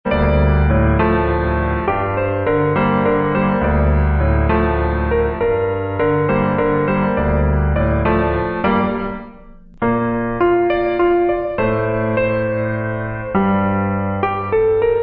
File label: Subtle Emotion